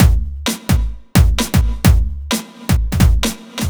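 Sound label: bass drum snare, Drum Loops 130bpm; Zero-G; Soundclash Nu Dance Electro; Acidized Wavs; Terradome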